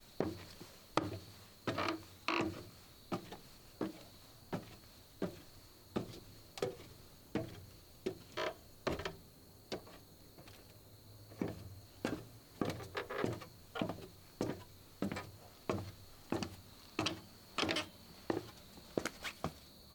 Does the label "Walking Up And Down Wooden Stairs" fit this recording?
Yes